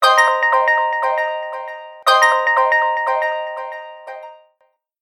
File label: echo long